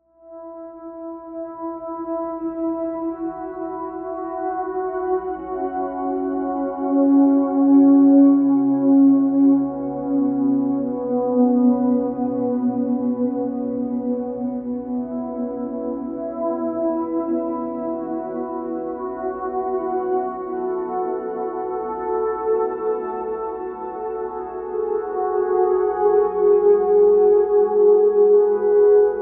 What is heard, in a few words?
pss470; blade runner